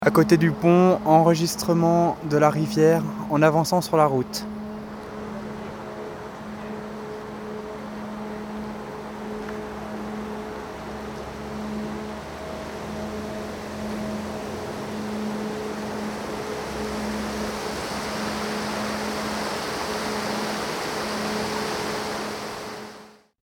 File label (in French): Riviere masquee